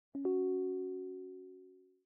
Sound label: new message; mail; odoo